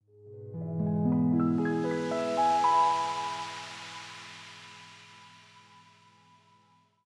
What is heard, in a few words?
Music Intro; sayonara wild hearts 2019 gamerip